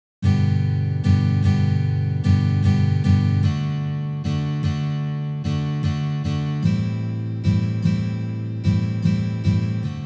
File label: Fill Real World Slow